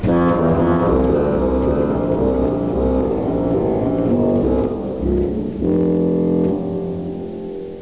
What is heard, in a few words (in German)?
Fagott 16; Martin